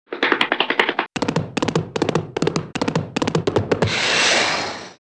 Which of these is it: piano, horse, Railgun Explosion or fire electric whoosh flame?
horse